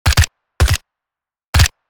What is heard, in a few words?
HK416 assault rifle suppressed fire